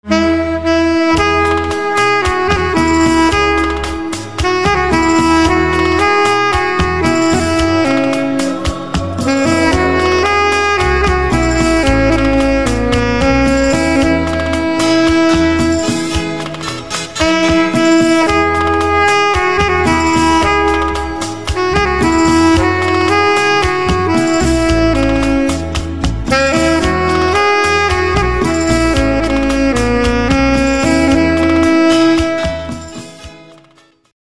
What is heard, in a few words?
Saxophone